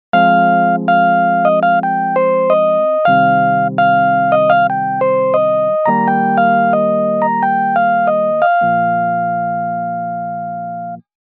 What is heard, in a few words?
Opening riff